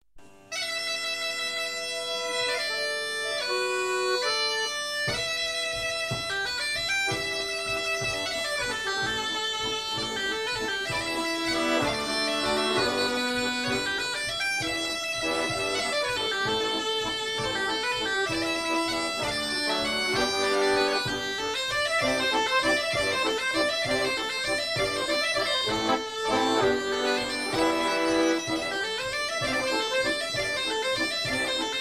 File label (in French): Valse chinoise cornemuse